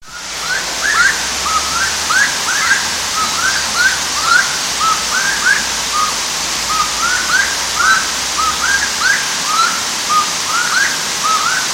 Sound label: Aramides saracura; Vl Quilombo